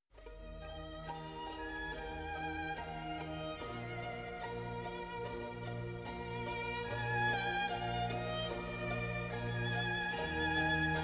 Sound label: Violin Concerto in F Minor; Vivaldi